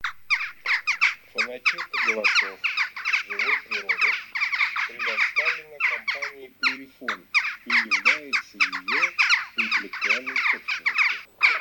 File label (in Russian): Галка Taccola; plurifon